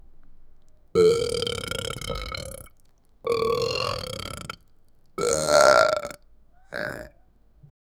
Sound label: burp of an adult, funny